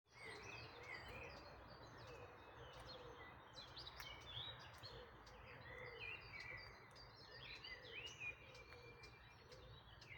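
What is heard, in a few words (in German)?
Dawn Chorus Mönchsgrasmücke, „tak“-Rufe der Mönchsgrasmücke; Der Dawn Chorus 2025 fand wie jedes Jahr im Mai statt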